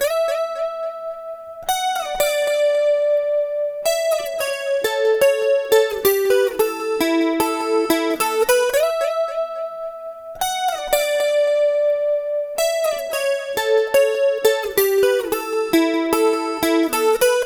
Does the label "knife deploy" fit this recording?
No